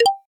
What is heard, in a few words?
drumset, drums; SKY IM-A850S-VEGA-R3; keytone